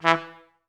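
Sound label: staccato E2; Best Service; Peter Siedlaczek's Advanced Orchestra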